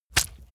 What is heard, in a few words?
leather impact mud hard squelch